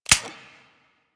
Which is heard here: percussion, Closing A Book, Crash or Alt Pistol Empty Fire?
Alt Pistol Empty Fire